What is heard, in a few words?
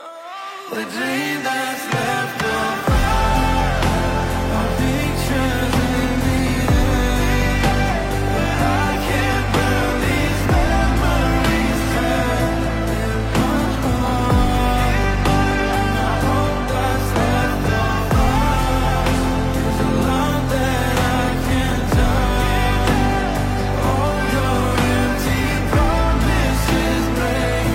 fireplace